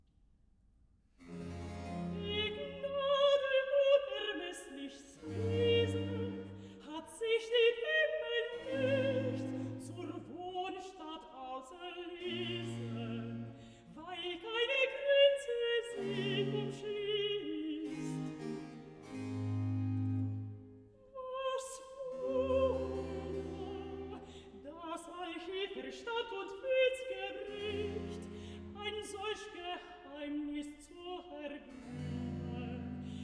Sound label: Recitative (contralto); Bach; cantatas; BWV121; Christum wir sollen loben schon